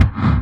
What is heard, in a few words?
hardbassdrumz, breakcore; Bazzdrumz